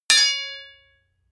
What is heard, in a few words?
hammer hit metal ring